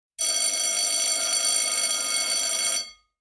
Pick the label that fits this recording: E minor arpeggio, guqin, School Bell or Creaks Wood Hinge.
School Bell